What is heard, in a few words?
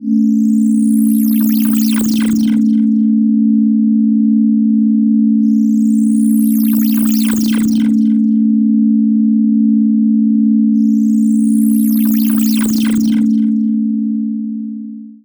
Spaceous, Ambient; Zero-G Seismic Frequencies; 90 s Sample CDs